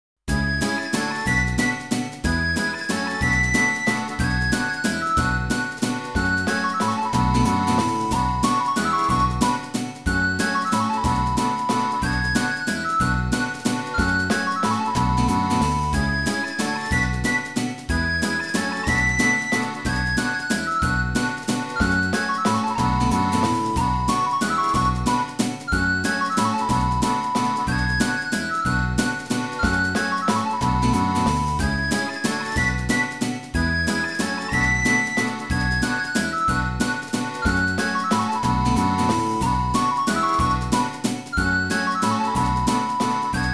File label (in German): schottischer tanz